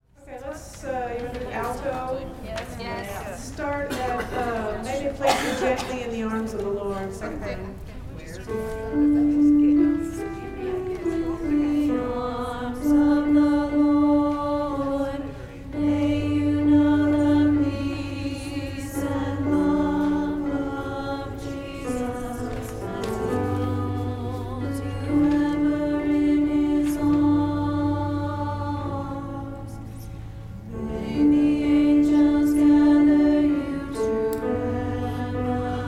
alto

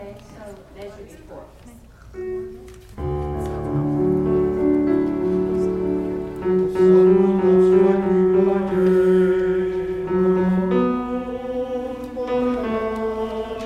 bass; I Lift Up Mine Eyes

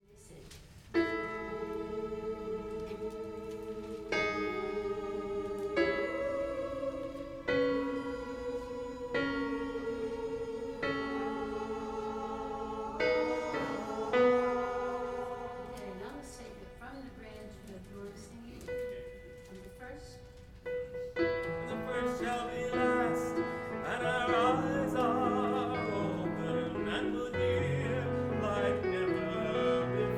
ladies on the bridge; Gather Hymnal

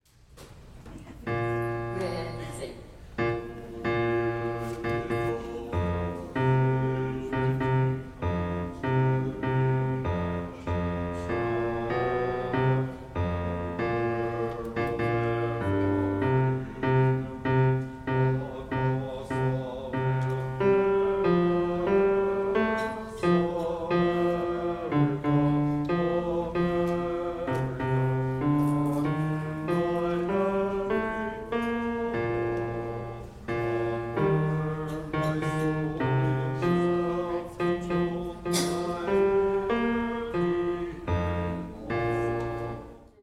bass; 984 America the Beautiful; Gather Hymnal

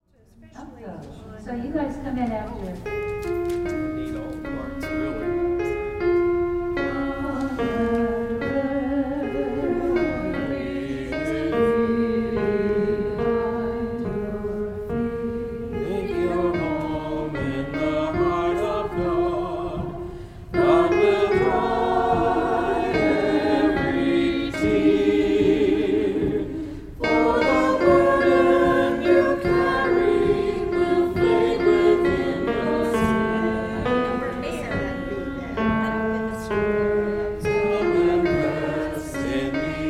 alto; Gather Hymnal

